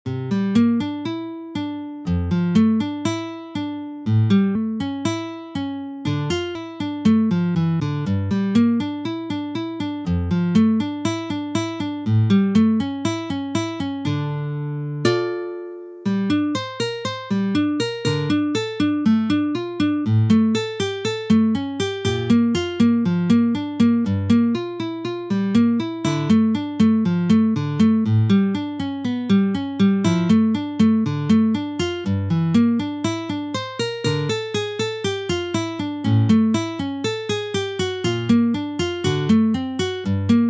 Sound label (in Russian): na gitare; TSyganochka